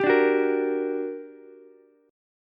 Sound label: G Minor Major 7th G, Ukulele; strummed